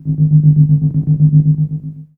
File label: 60S SF; Best Service Dream Experience; SF+EFFECTS